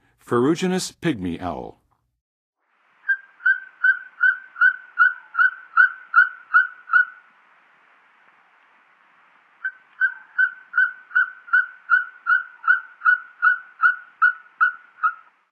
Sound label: Ferruginous Pygmy-Owl, Birds of North America; Western USA; Western USA Songbirds